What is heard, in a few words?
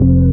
DM ORG1, Dance Mega Synth